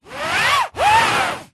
foley fingers rub scratch canvas art picture up down